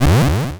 upgrade get

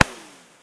pop; World Of Goo; Fuse

